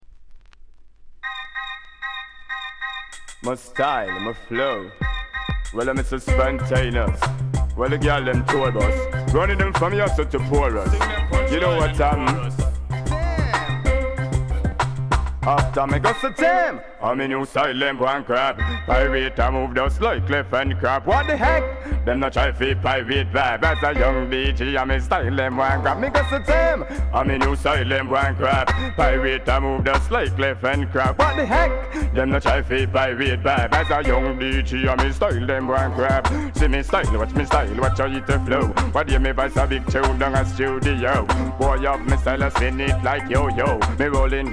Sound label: new style riddim 90s